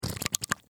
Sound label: Household Gel Bottle Squeeze Liquid Bubble Blurp Hair Product; Bathroom